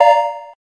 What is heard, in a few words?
electric, keytone